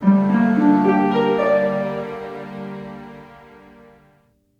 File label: Ui Game Over; Eternal Radiance